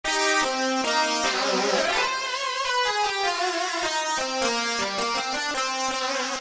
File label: Squeeze Lead, Roland PN-D50-03